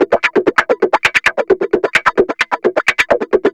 GUITARS 135, House

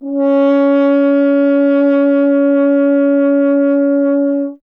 F. Horn

